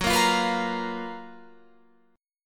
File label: Gb Major 11th down Guitar Open F; GbM11 strummed; JGuitar